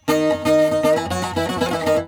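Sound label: D#, bouzouki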